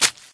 Defeat Attack; smite; god bakasura

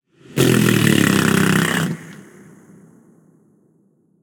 nv tongue; smite; voicepack; hachiman

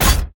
counterhit; Smite; god Kuzenbo

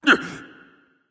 surprise; smite

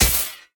wall of spears wall damage; smite; odin

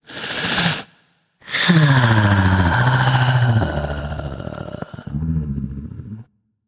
nv breath; smite; voicepack; ganesha

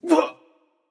grunt hit; smite; janus

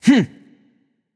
gruntjump; smite; voicepack; mercury

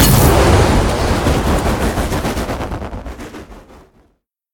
Typhoon; Smite; Susano

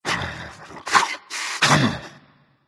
grumble berserker; cuchulainn

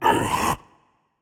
breath, voicepack; smite; jorm

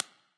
Clap; smite; bakasura v2 skin8

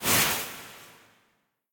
fire impact; smite; zhongkui

